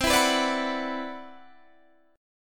C Major 9th Sharp 11th, guitar chord

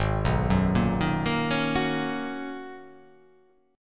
G sharp Minor 11th G sharp, chord

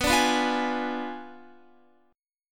B Diminished 7th B down Guitar; strummed; tuning 4F,4A,5C,5G,5C,6E

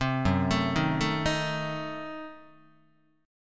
F sharp Diminished 7th, down, Guitar; arpeggiated; 4A,4E,5A,5D,5G#,6C#; 3,2,0,1,1,2

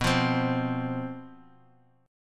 C Major 7th Suspended 4th, guitar

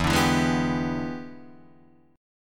E 7th Sharp 9th Guitar Open D, chord

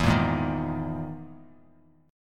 E Major 7th Flat 5th down Bass Standard; EM7b5 strummed; JGuitar

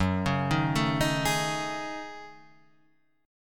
F sharp Minor 6th Add 9th, Guitar; F#m6add9 Chord {2 4 1 2 4 4} arpeggiated

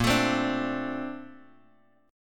A sharp Major 9th, Bass Standard; strummed

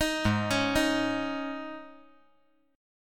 G sharp Suspended 4th G sharp

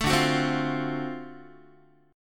D 7th Sharp 9th, Guitar, chord; D7#9 strummed; 12,10,9,10,9,x; JGuitar

